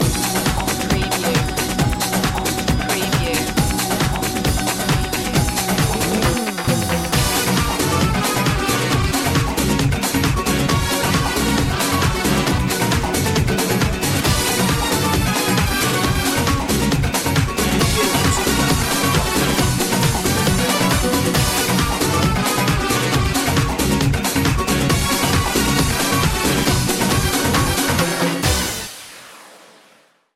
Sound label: tango ole